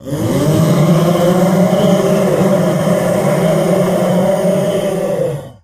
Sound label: controller presence l